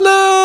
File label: VOX LOO G5, Scats Choir; 90s Sample CDs; Club-50 - Foundations Roland; Short Scats